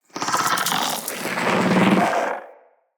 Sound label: Root Spider Elite Vocal Chatter; Animals; Spiders; Vocals